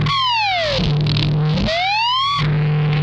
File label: CLANGERS, Funk Guitar; 90 s Sample CDs; Zero G